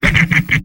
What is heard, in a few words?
sniper laugh short; vo; tf2; mvm